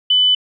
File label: DP One Chime